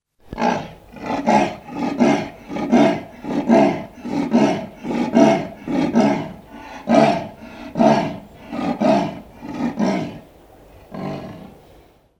ingressive leopard sawing

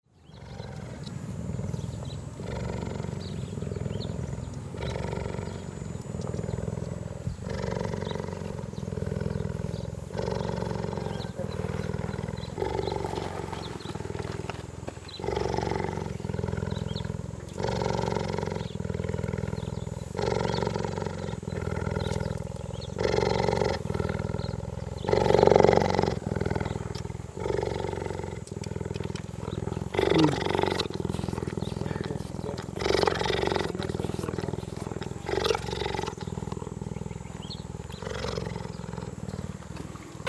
cheetah purring; ingressive

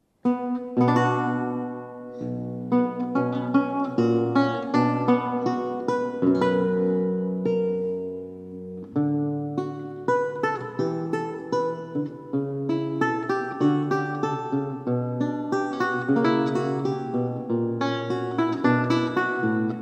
15 course Archlute Preludio; Zamboni

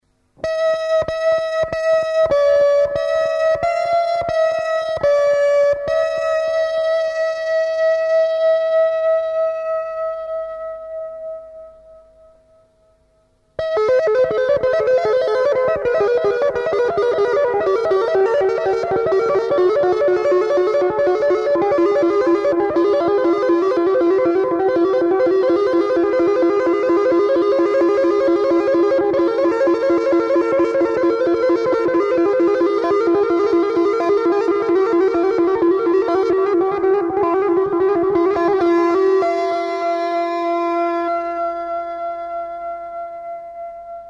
Electric Guitar Heavy Metal

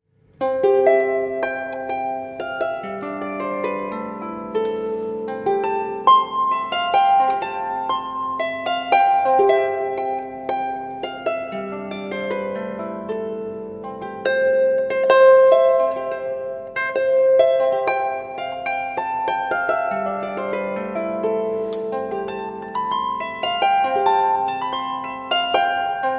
Celtic Harp; A Scots Tune

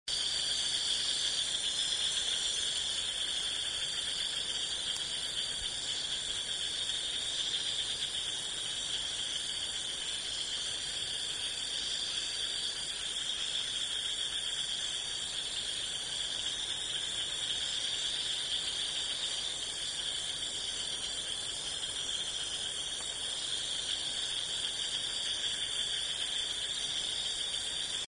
Night Sounds

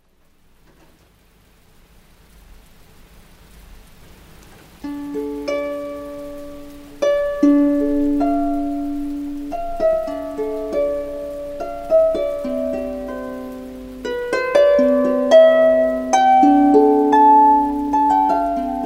Celtic Harp; Palastinalied

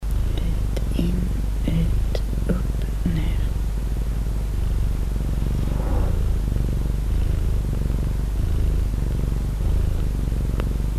Purring Domestic Cat; 16bit 44Hz

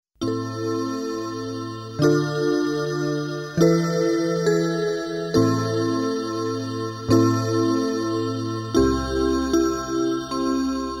Synthesizer

